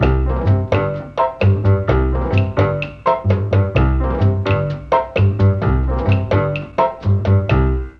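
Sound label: bass strings, Mellotron; Mike Pinder - Mellotron Archive CD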